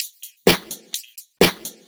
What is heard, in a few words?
Electro Loop 128 BPM; Vengeance Samples